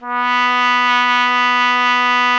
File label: BRS TRUMPETS, Roland LA